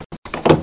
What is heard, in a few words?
Unlock